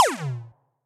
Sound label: 8bit lazer; Effects; Ableton; Factory Packs; Beat Tools